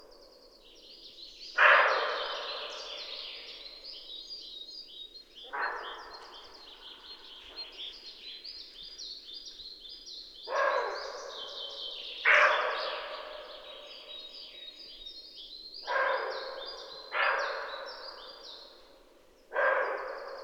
Siberian Roe Deer Capreolus pygargus, Dzhukey, Kazakhstan